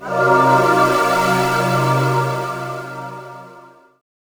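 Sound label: jazz chord, pads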